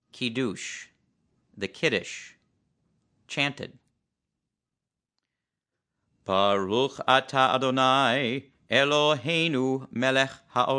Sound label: kiddush chanted